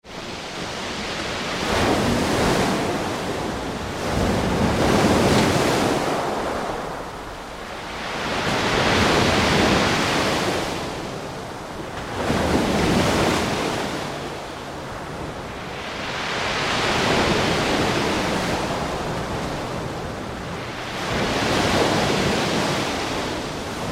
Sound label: Ocean Sea Rough Surf onto Pebble Beach; Recorded from under pier; Ocean Sound Effects